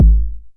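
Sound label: 909 BD tape, kick drum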